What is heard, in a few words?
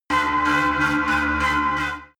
extrinsic creak; energie